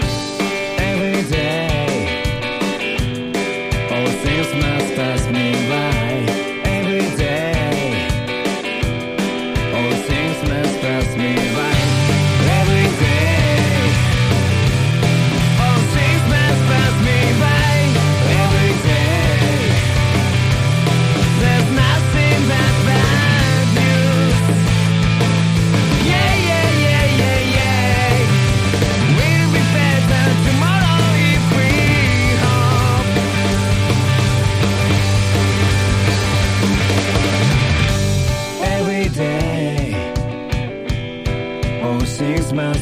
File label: indie guitar swing